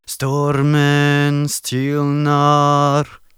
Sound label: Battle Cry, Viking Vocal, Un-Processed; Battle Cries & Phrases; Ghosthack; SkyRealm; Viking Vocal Builder